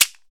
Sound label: PRC WOLOF, Afro Shakers